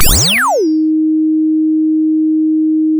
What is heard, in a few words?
sound of a bike bell